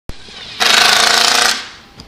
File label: working tool